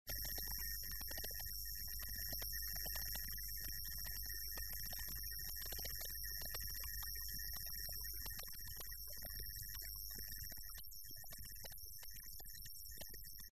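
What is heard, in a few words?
unknown signal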